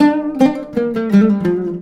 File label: LUTH J, Arabian Traditions, LUTH RIFF; USB Soundscan vol.43 - ArabianTraditions; AKAI